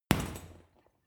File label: boxing punching bag hit wiggle; boxen